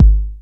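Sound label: HEAVY DEEP, TECHNO TRANCE; Pro-Rec - Synthsonic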